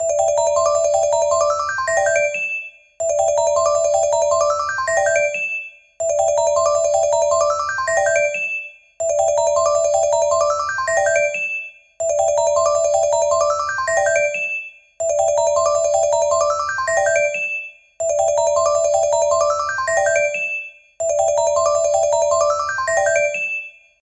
Hover Station Alert

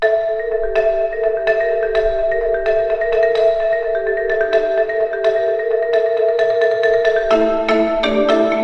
Xylophone Msg Tone

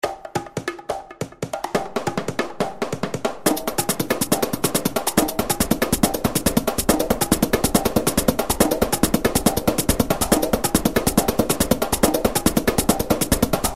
bongo drum

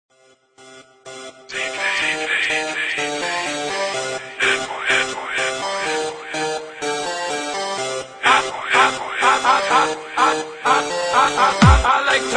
techno mix, ringtones

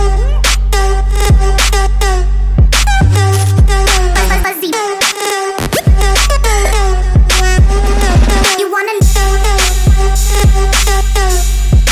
Bulgarian Trap

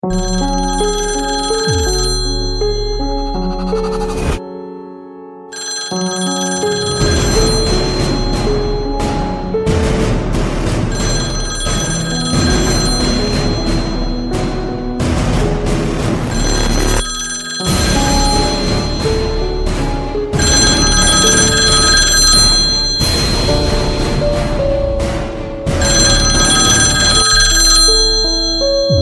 Old Phone

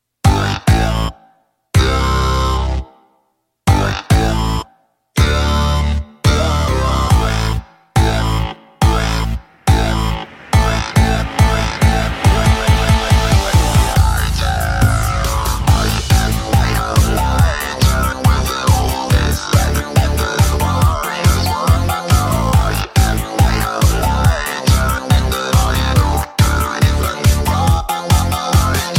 BASS

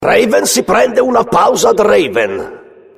Suspense

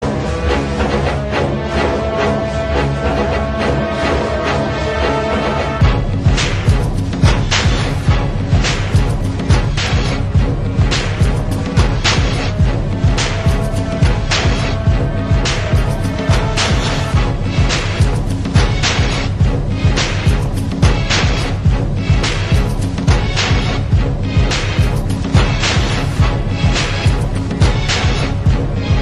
hip hop

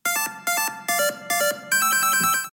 beam wand

